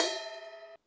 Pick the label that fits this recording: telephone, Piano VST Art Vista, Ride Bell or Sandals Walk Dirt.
Ride Bell